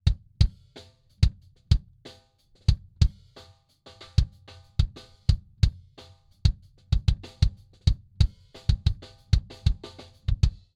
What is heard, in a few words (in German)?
drumset kick drum solo vor dem schallloch; img stageline